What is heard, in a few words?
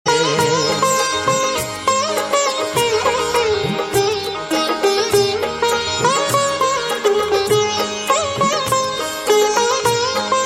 nice sitar; classical